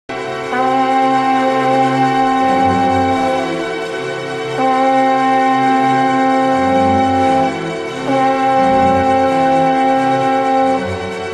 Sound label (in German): Stierhorn